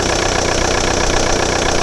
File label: golf, Vehicles